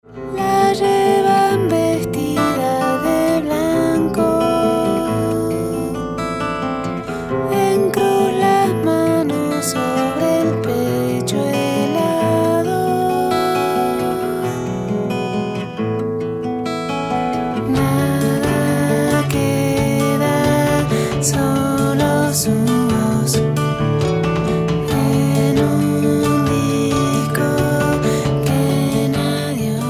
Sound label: solo, music